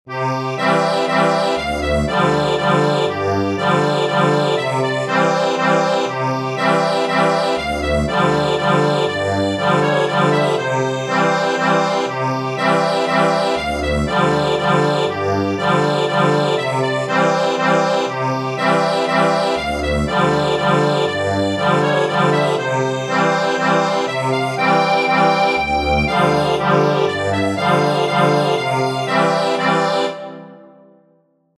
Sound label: Swedish Dance, accordion